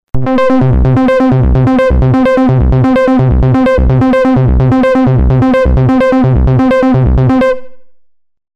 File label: Synbass; Monologue